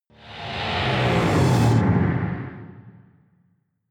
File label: air suck hiss